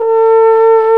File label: TENORHORN A2; Best Service; Alpine Volksmusik